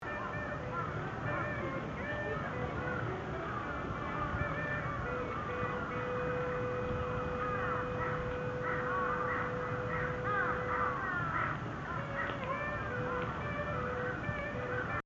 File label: Crows in Yoyogi park